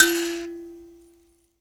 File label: PLK Grand Mbira